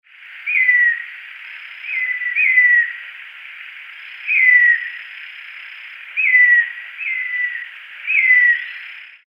Attila phoenicurus whistle; Salerno

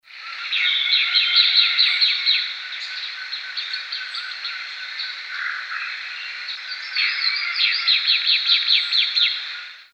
Conopias trivirgata calls, Itabo Itaipu

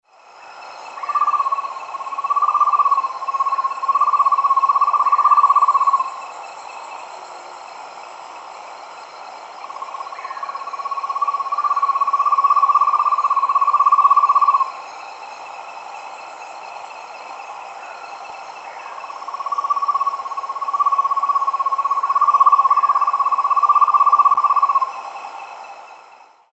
Aegolius harrisi, Itabo Itaipu

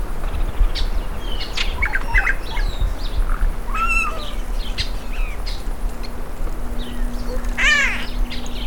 Cacicus solitarius

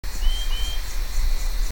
Euphonia chlorotica feebee

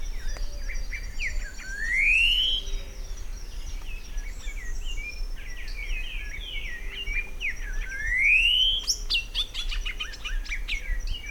Saltator coerulescens

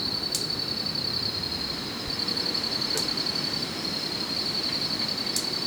Corythopis delalandi bill snaps; recorded PROCOSARA, PN San Rafael; Alberto Esquivel November 2006

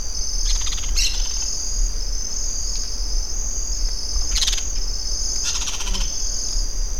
Brotogeris chiriri perched

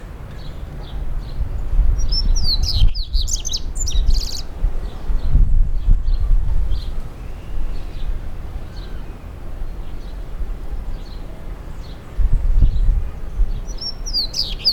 Sporophila coerulescens long

